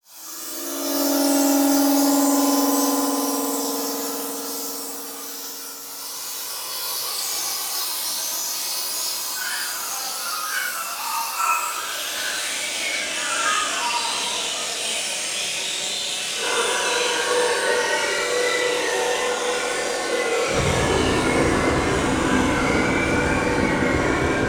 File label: Distortion Spooky Voices; AdobeCC; Imaging Elements; Module Production Element Title Imaging